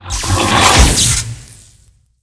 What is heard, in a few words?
felidae attack, monster